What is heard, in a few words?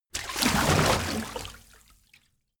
splash long; haus garten